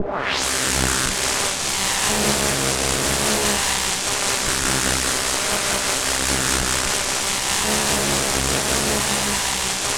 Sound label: BUZZ OFF, TEKNORAMA; Spectrasonic Distorted Reality 2